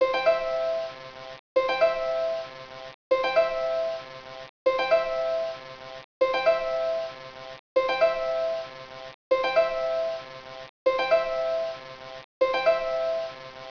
harpa Loop

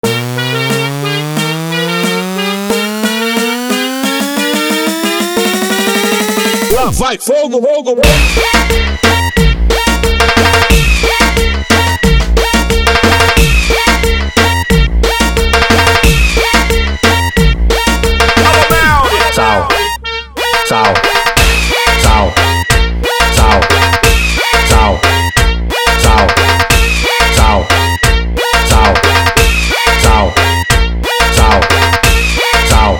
INTRO SOLO

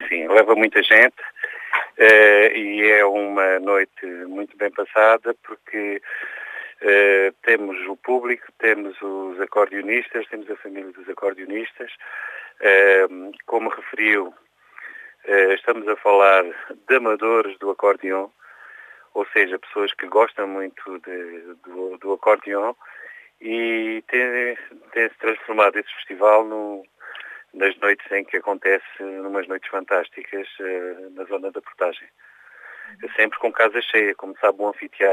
ACORDEONS

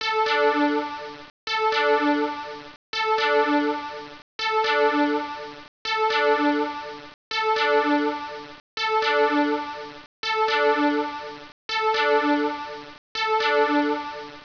sint Loop

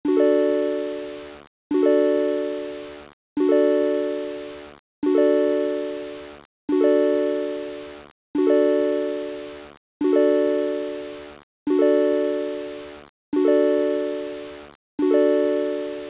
triangular Loop

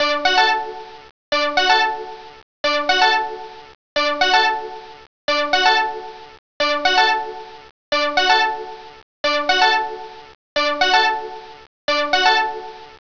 sint Loop

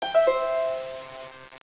harpa

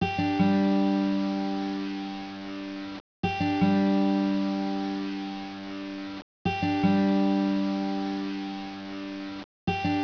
guitarra Loop

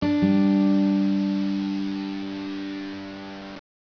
guitarra

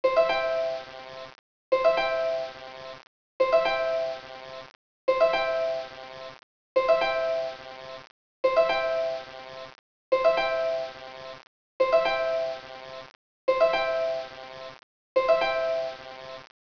harpa Loop

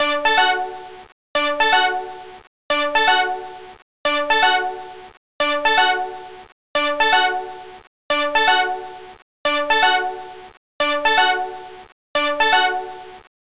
sint Loop

